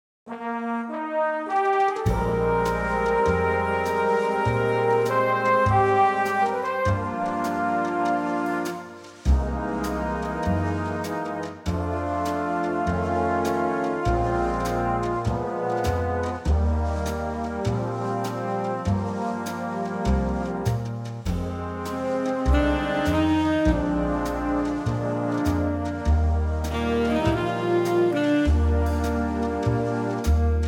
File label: Big Band Eb, backing tracks for male vocals